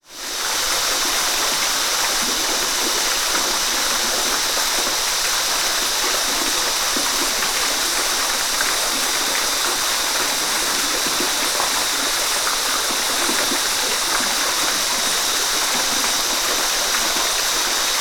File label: nature ambience rainforest australia small waterfall close insects day